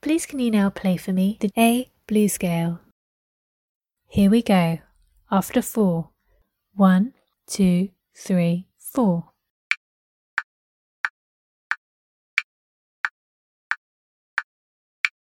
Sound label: a blues scale